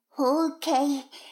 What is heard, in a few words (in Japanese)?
シコシコ音声; 逆時間停止されて